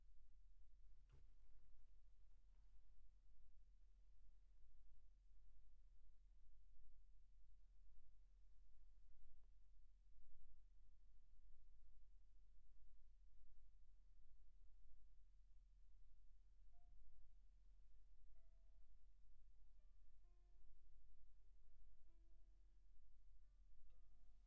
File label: Sax